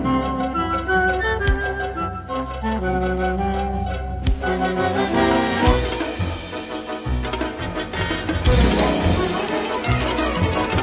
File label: simps music; tls simpson town